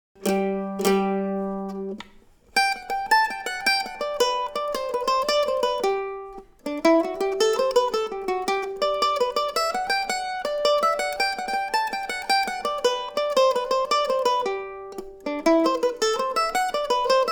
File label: Pilsen Jig, mandolin; bates no 18